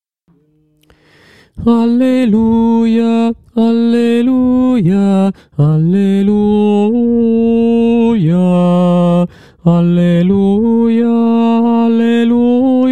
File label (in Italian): tenori; alleluia concordia